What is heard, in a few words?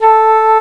flute A3; ClockWerx Demo Music